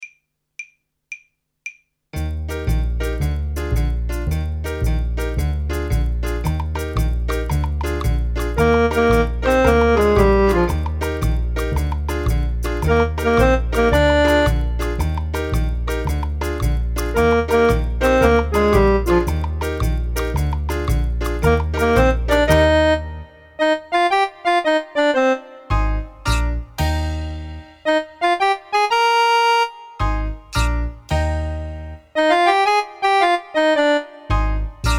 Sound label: saxophone; mib